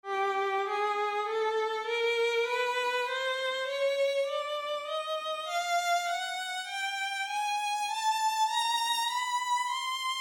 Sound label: hurdy gurdy; AJ Hipkins